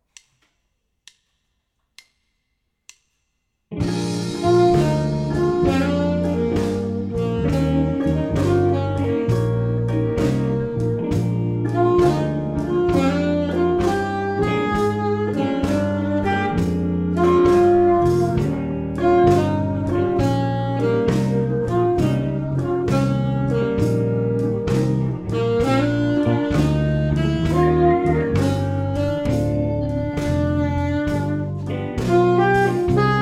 alto blues with improv

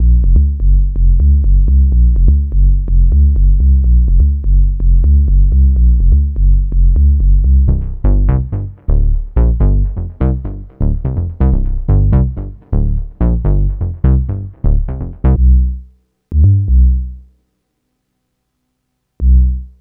deep house basslines

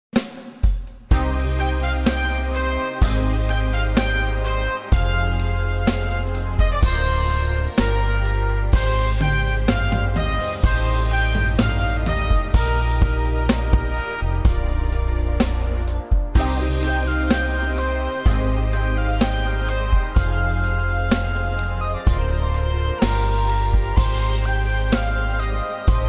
126 bpm; neuland

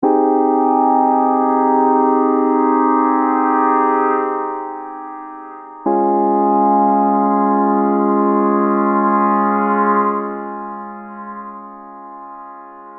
melodic techno

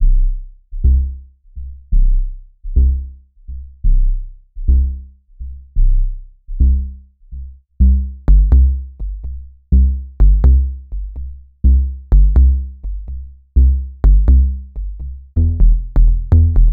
deep house basslines

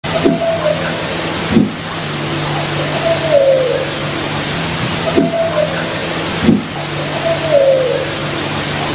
strange call dusk; bena 2023

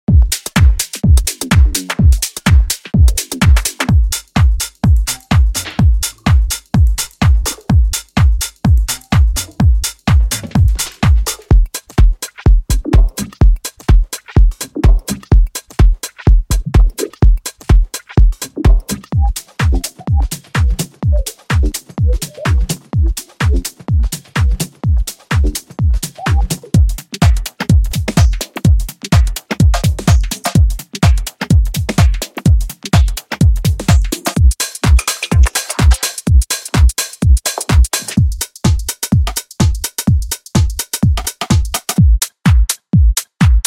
minimal deep tech; minimal deep tech vol 2

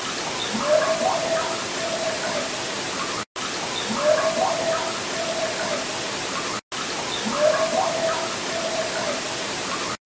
howl; the russ howl 2023

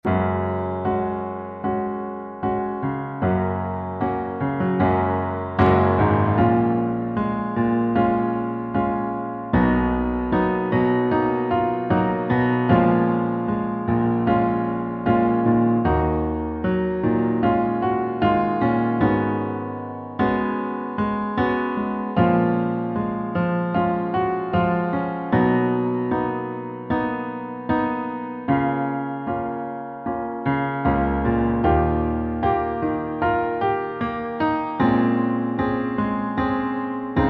beautiful city accomp

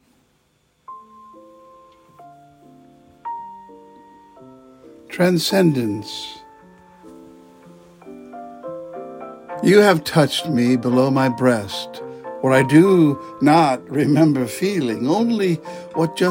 waltz

